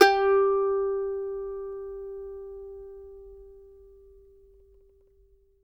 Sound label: MANDO NOTES, G4; Sampleheads; Whole Lotta Country 1 & 2